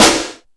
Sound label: All Snares, E-MU Pro-Cussion; drum machine